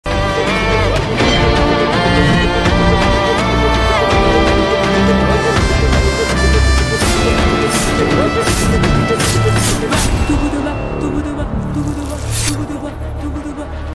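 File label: background music ringtone; dasara; nani; keerthy suresh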